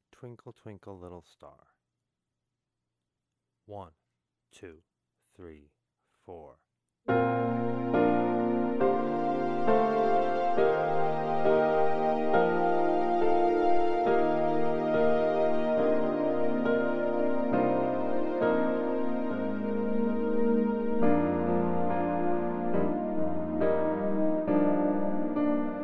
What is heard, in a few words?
Play alongs Twinkle Twinkle Little Star